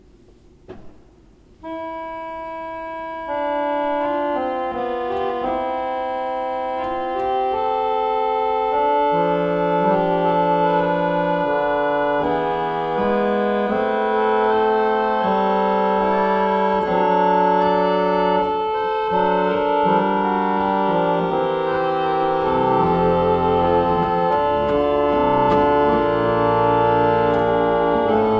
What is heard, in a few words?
pipe organ; Rushworth und Dreaper